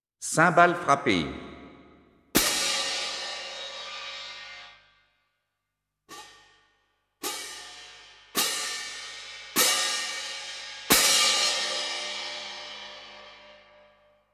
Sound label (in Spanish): Platillos